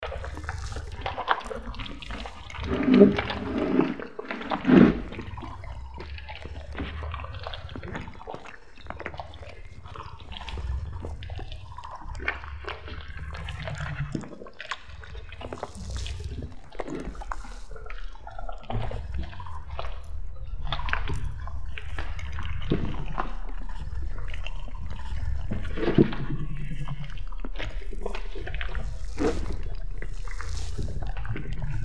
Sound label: aliens eat atmo